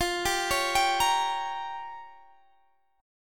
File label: A sharp Minor 6th